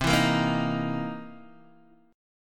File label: C 7th Sharp 9th, Guitar, DADGAD